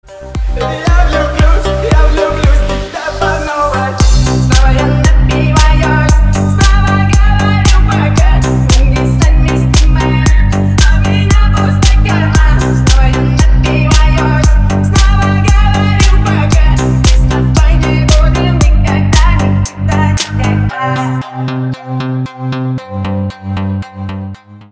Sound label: remix